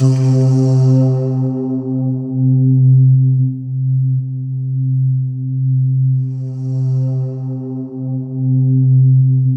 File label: C4, Synth Leads